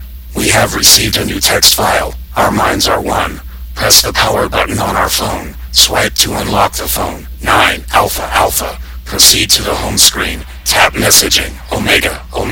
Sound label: Message Alert; Borg